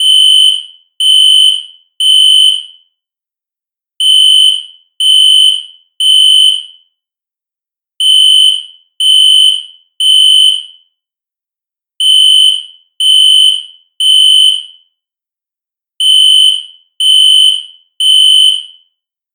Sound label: fire alarm synth reverb